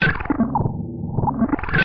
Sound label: phasing vibraloop; LOOPING AMBIENCE